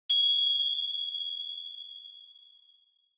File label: Onoma Flash Mid Long; オノマトペ 光る04-3(中 長); 効果音＞オノマトペ・光る